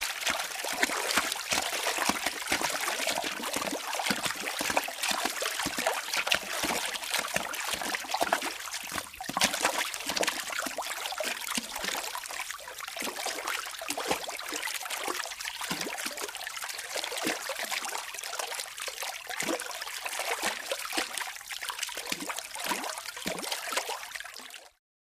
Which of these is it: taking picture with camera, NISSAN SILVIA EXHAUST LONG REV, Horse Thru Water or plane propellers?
Horse Thru Water